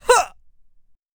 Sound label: teleport; sora; aragami 2016 gamerip